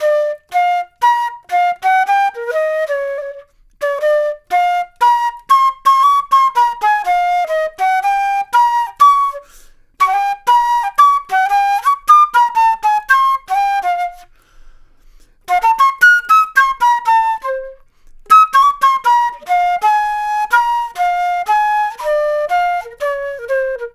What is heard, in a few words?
Flute Solo, Jazz